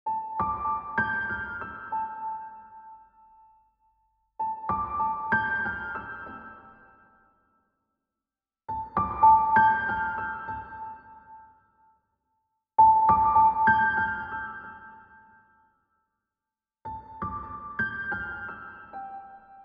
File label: PIANO SIMPLE MOTIF